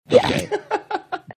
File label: snorting laughing